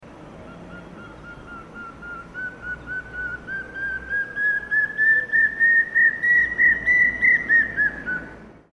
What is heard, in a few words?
Common Hawk Cuckoo, Katukitula; Sri Lanka